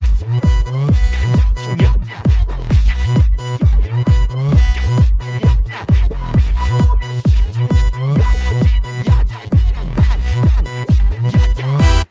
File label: background